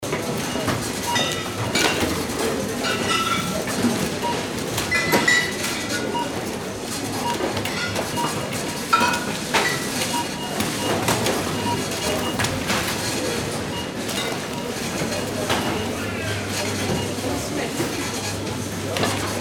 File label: supermarket cash till